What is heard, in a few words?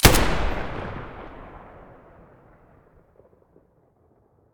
muzzle galil stereo, weapons